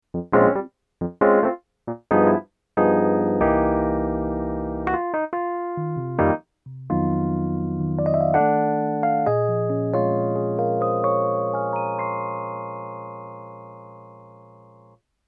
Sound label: rhodes